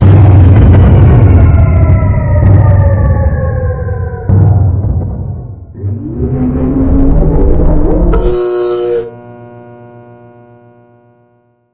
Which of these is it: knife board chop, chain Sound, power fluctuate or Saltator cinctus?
power fluctuate